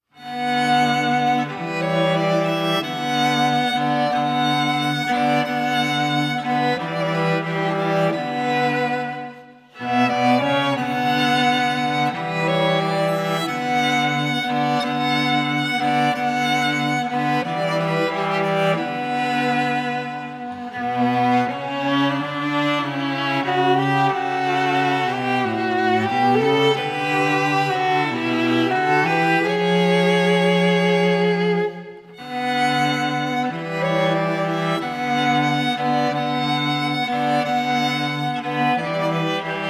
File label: Swan Lake Theme Trio